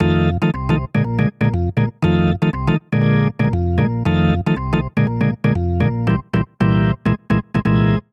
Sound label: Vamp Latin Boogaloo Organ F7 - Bb7 - C7#5 118 bpm; Electric Keyboards; Ableton; Factory Packs